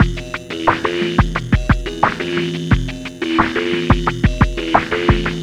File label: DARK MATTER PULSE GROOVE, ARPEGGIATOR DRUM MACHINE; Sonic Foundry (Sony Creative Software); Vir Unis Infusion